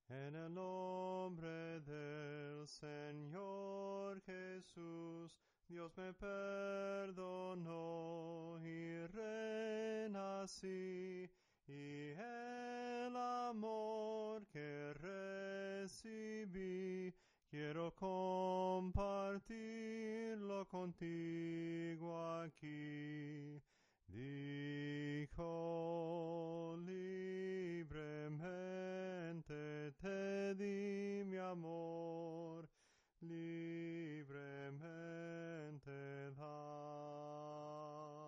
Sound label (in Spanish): SOPRANO CANTADO; EN EL NOMBRE DEL SENOR JESUS